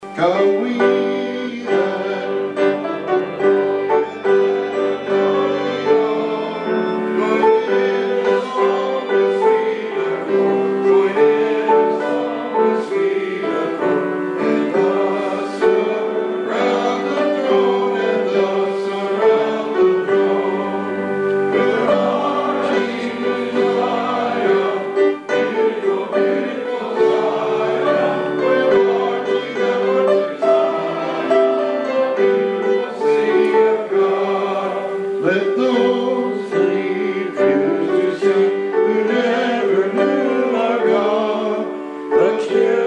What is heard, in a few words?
Men's Choir